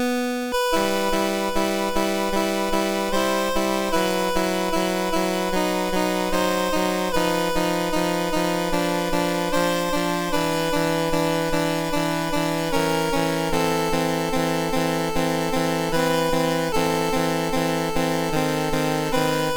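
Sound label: Prelude in E Minor Op28 No4, MOS 6581 SID chip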